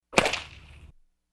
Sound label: WHIP